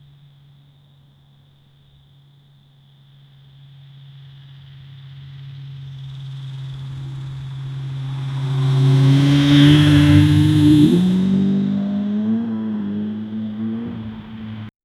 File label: the sound of a motorcycle; vehicles